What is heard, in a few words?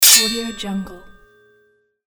sword hit impact